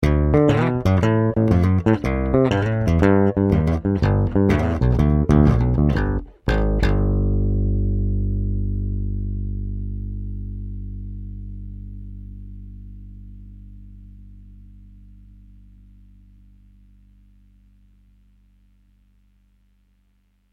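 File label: sounds with effect of completion, ending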